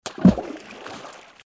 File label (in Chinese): 鱼进水声音